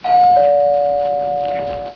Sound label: door bell old